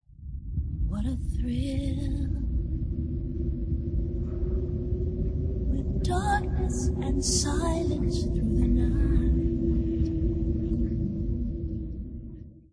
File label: ladder; GMod-Library; mgs3